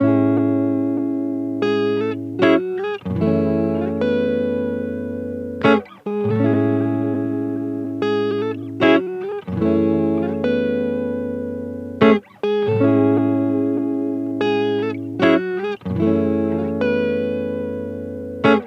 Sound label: Electric Guitar - 75 BPM C# Min, dry; Melodics; Cymatics; Eternity Sample Pack; Guitars - Electric